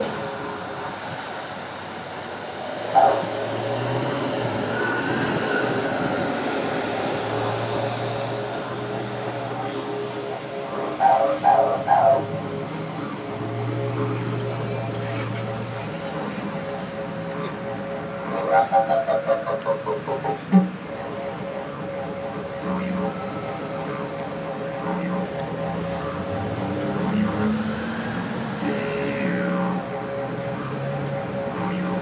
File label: busker playing a didgeridoo on a sydney street corner